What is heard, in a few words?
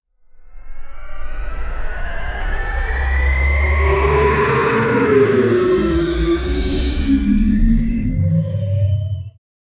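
hyperspeed tunnel starship; motion blur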